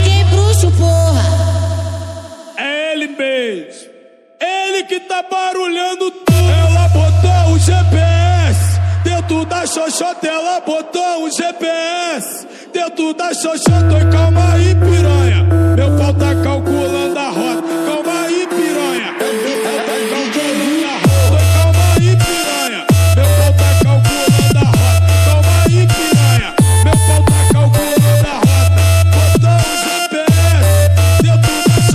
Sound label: funk bass